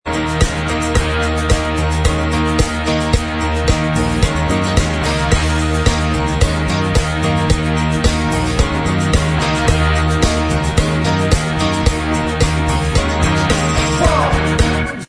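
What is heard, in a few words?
instrumental track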